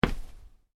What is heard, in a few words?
Footstep wood light, Shoes: Individual Footstep On Wood, Light; Human; Footsteps